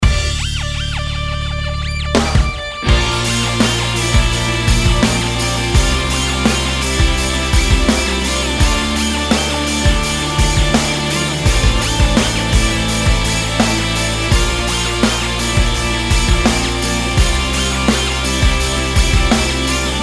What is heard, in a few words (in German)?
test gitall, gitarrensound